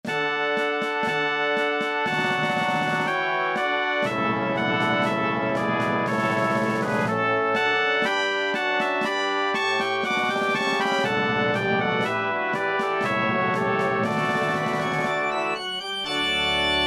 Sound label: organ and brass